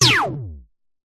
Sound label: Laser-Like Synth Basic Laser; Sound Spark